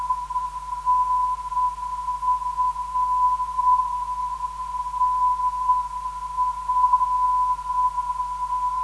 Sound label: NF 397kHz